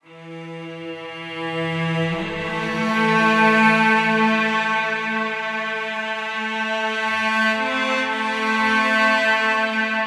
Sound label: Cello Intro - Am 60Bpm; Construction Kits; STEMS; Ghosthack; SkyRealm; Kit 03 - Winterhold